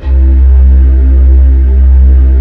PADS; Pro-Rec - Synthsonic; TR PADS

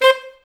SAX A. STAB, Alto Section; Roland L-CD702

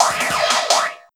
Snare Fill; Sonic Foundry (Sony Creative Software); Esoterik Beatz; PH7 FX

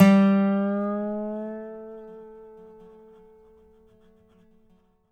DOBRO UP, A3S

